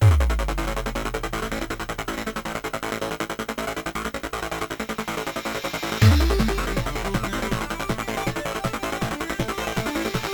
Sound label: Music Intro; Warframe; Caliber Chicks Minigame